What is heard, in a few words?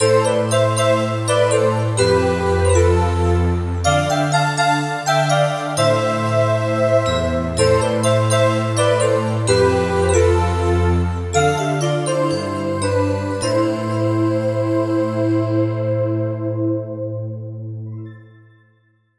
fanta bell